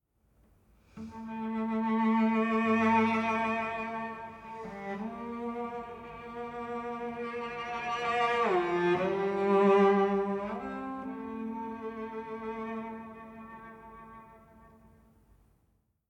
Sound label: Savannah Music